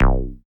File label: SNGL.BASS, Jungle Rave; Best Service Dance Mega Jungle Rave